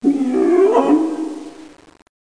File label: jungle; Renaissance